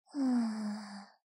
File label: Mita Hmm; Game rip; MiSide